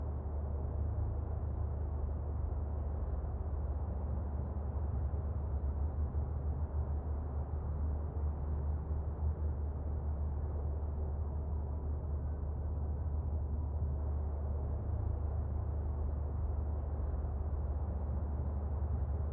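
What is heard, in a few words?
city ext loop, ambient city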